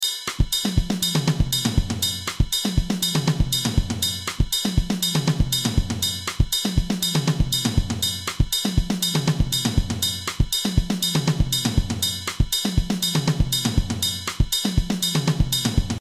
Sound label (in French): songo batterie